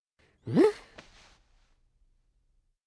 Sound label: shrug